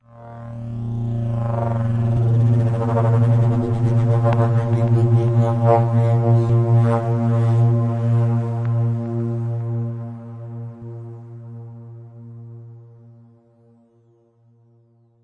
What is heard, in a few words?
approaching spindown synth